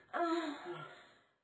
Moan

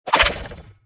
attack projectile; Li Li Shadowpaw Sound Effects; Li Li Heroes of the Storm Responses; warcraft

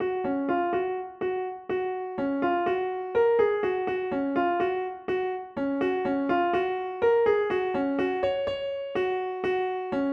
Choir Remix

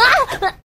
Grunt; Lewd SFX

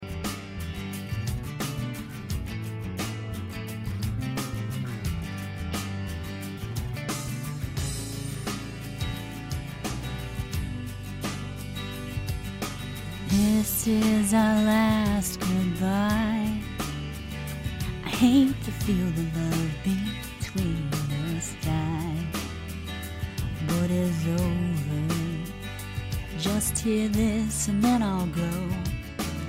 singing

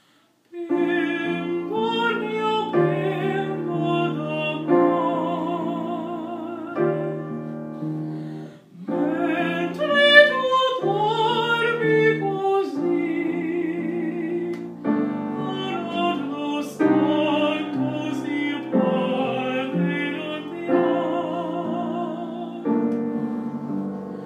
Opera; Sogno D'or - Puccini

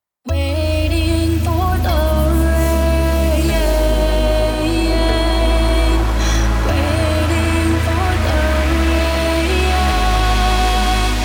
Hardstyle Remix

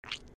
Suck; Lewd SFX

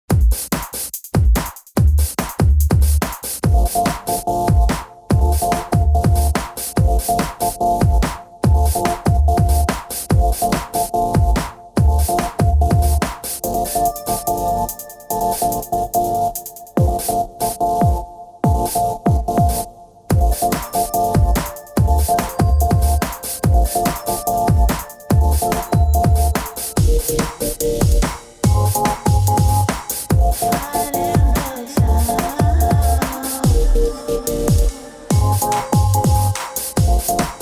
Beat; Resurfaced Pony Beats; Carousel Boutique

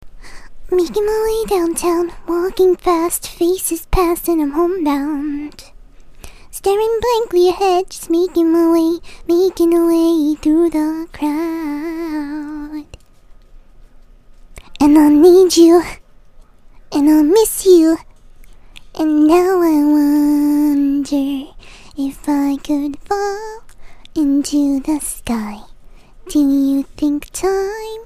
Singing; Fluttershy

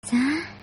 Breath; Lewd SFX

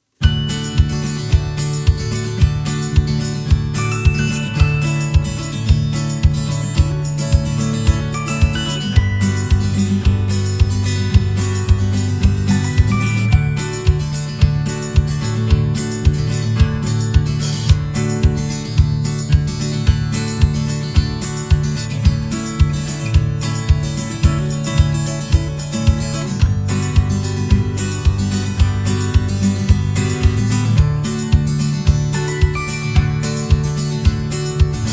Birdsong Instrumental

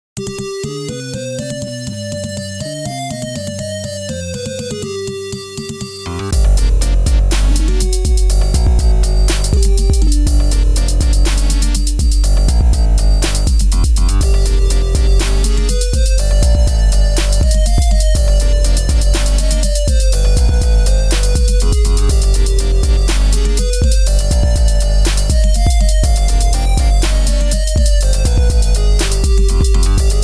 trap

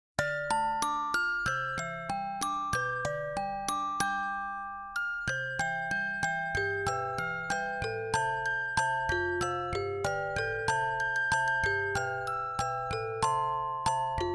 Music Box Version

